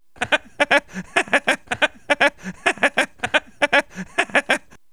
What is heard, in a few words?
pond frog